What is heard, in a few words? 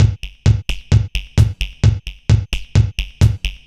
Beat (130BPM), techno; techno drum fx